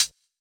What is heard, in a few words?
Cymbals HH Closed; Vengeance Samples